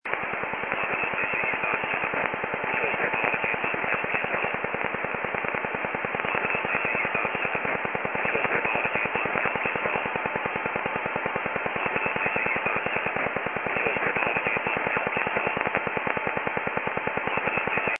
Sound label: woodpecker; Duga 1-3 "The russian Woodpecker" replica from 1982 Cold War.